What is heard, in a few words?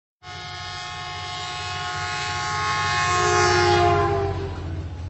Train Horn